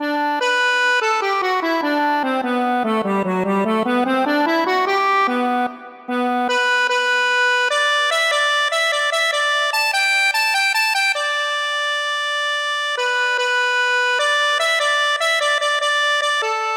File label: accordion; the easy winners